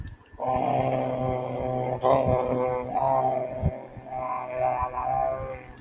Music Musical instrument Didgeridoo, vocal imitation